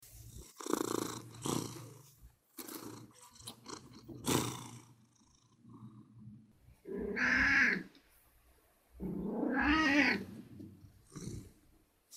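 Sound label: cat started purring but does not understand what is wanted from it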